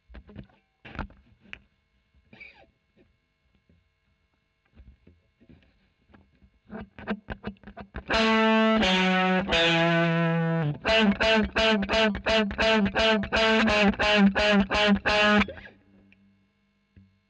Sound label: E. GTR Royer OD